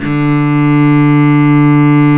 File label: cello, Arco Solo; String Master Series